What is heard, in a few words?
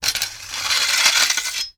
medieval sabre draw from sheath slow; still north media